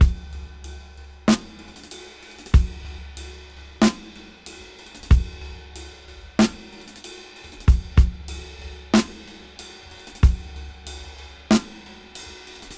Half Time Slow 94 BPM

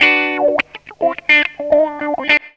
GTR, GTR RIFFS, Funky Elements; Zero-G

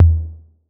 808 TOMS; Wizoo - Platinum 24 Electronic

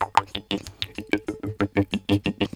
KHOMUS

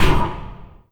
Oneshot; Sonic Foundry (Sony Creative Software); Esoterik Beatz; Warsaw

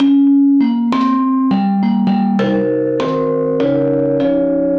Dirt Vibe, 100bpm; Loops n Lines

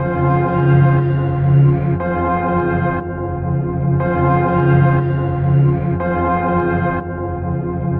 Psychic Pad; Sonic Foundry (Sony Creative Software); Loop Noir; Session 08 - Psychic Investigator

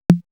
Snare, Drum One Shots

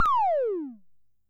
tom, Coron Drum Synce DS-7

